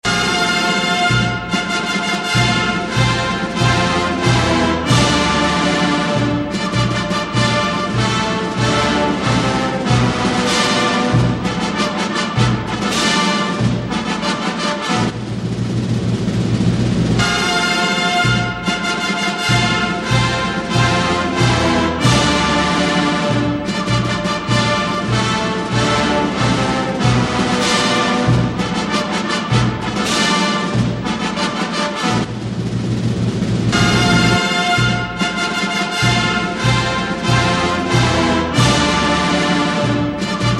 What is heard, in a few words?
Fanfare; FAUST Soundtrack; Kaiser